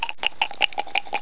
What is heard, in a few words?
DICE